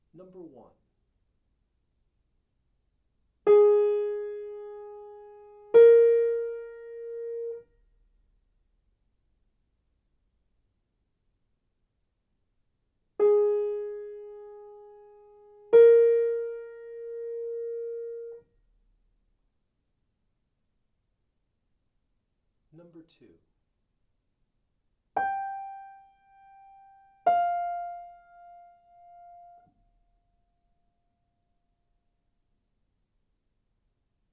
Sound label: intervals; eartraining